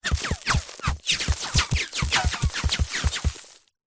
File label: several bullets hit ground dirt fast; zapsplat